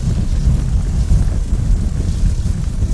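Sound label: lava ambience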